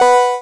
Button OK

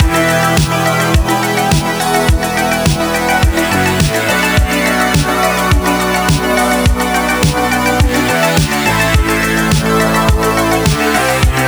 Horn Path Loop 105bpm; Furi; Windows gamerip 2016

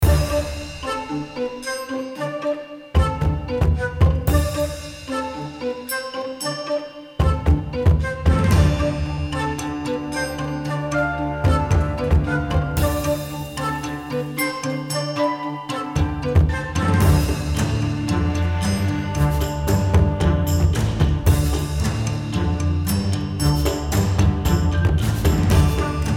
Main Theme Loop; Robin; Return of the Heir

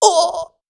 Civilian Pain; Cruelty Squad

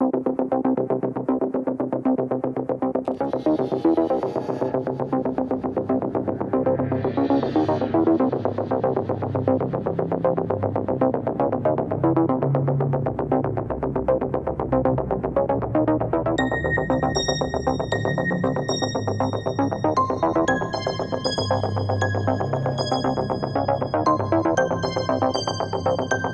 Music Fight Loop break; Nemesis

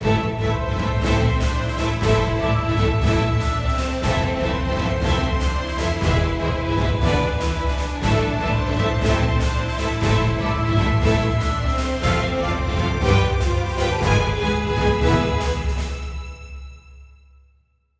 heroic forte; Quivr; PS4 Windows gamerip 2016

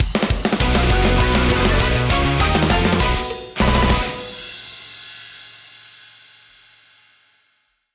Win Jingle; Attack-a of the Whacka!

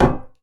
Impact Metal

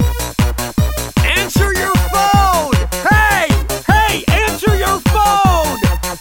Cookies Ringtone; You Don't Hear Jack 2

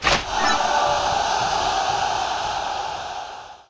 Football goal

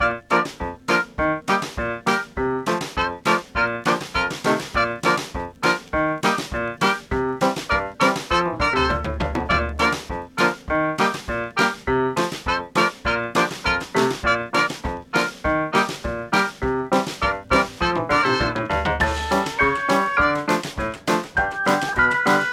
survival music band; Pix the Cat

